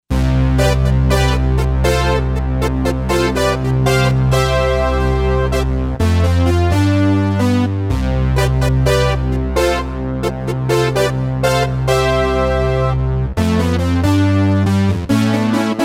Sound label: Syn Brass 880, Roland SC-880; Factory Patches